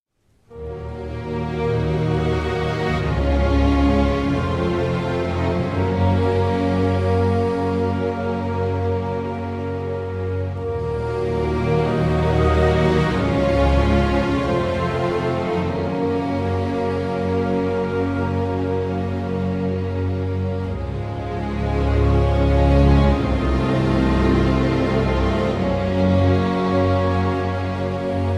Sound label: Orchestral